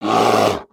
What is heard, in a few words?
Pets Bear Panda Rage; creatures; Lego